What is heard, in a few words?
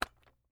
Technology Electronic Joystick Button Pushing Single